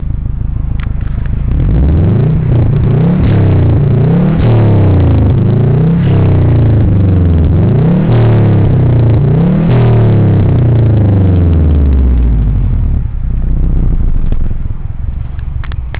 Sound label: car